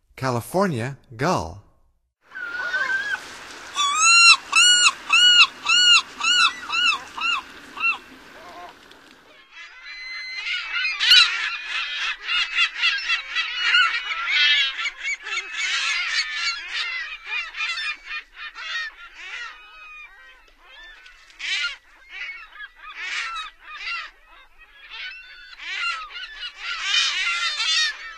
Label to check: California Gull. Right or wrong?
Right